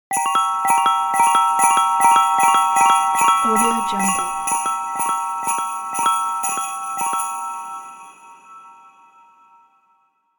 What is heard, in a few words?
magic bell transition